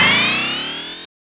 alarm

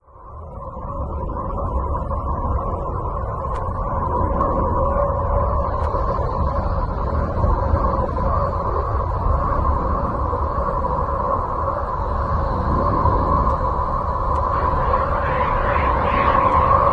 escalate

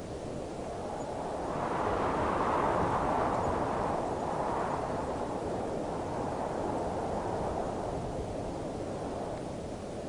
wind loop

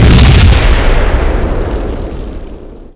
bomb hit

